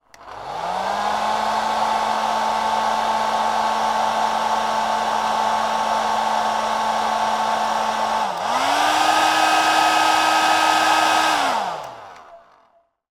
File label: hairdryer on operate off; household